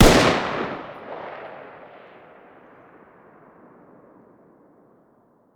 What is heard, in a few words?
fire dist 556x45 mg ext; gmod; arccw uc commons attachments